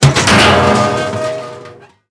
metal barrel; World of Tanks Blitz

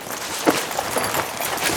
bigjump a jump; Call of Duty Modern Warfare

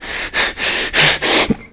player climb breaths; player climb breaths tmp

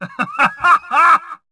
hah hah

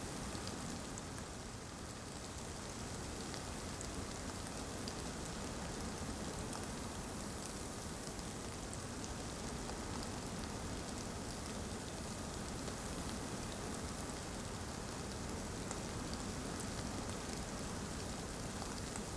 ambience desert day calm drizzle; Rust

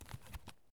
item medkit putaway; Escape from Tarkov

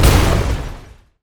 damage hits explosions, Warships

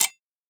flint strike; Rust

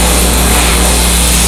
beam target hit loop

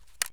fal stock open; Escape from Tarkov

